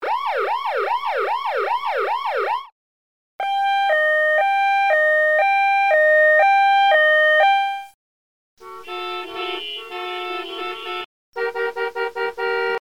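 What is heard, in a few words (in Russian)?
полицейская машина; obichno ispolzuemij zvukovoj effekt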